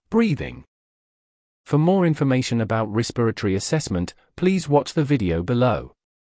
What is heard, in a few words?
BREATHING; Basic Clinical Observations